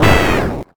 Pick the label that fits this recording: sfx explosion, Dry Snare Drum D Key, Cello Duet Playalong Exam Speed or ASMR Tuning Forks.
sfx explosion